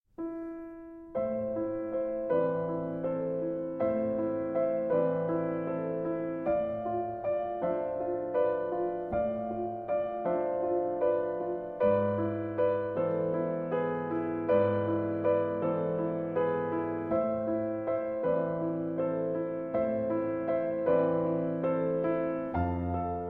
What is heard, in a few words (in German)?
Schlaflied